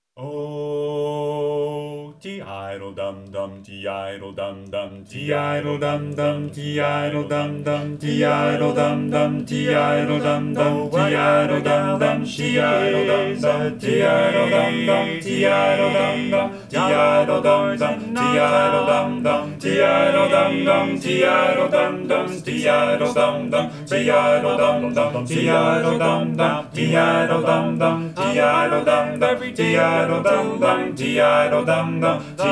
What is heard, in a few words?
baritone right; 2024 spring show